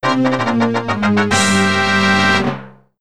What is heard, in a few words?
Clear, soundtrack; B3313; n64